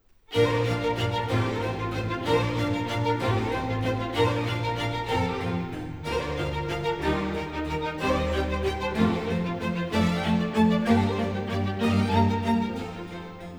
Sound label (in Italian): Concerto per archi in Si maggiore, Allegro; Antonio Vivaldi (1678 - 1741)